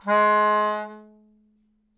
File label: Melodica Long, Long Notes Chromatic